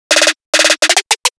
Riddim Drum Fill (140); Drum Fills; Ghosthack; Riddim Essentials 2020

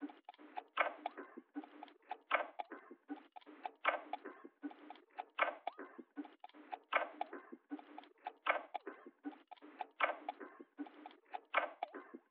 156 BPM F Min Percussion Loop; Cymatics; Intergalactic; 2022 Melody Collection Platinum Expansion

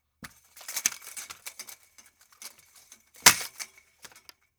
Window Blinds Loud; Fairlight Sound Lib

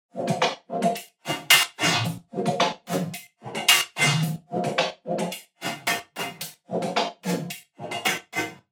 Drum Loop 110 BPM Percussion; Experimental Drum Loops; Loop Stems; Cymatics; Mystery Pack Vol 4

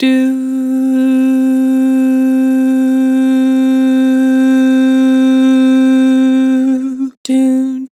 Vibrato "Doo", Vocals; Harmor Vocals

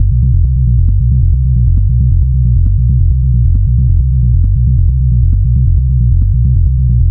Bass Loop G 135, Techno; Ghosthack; AC2024 - Day 1 - Techno Sounds 2025

